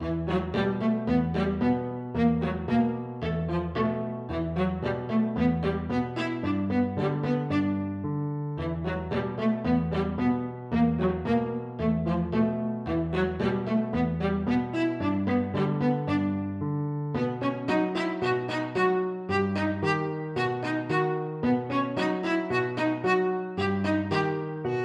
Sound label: viola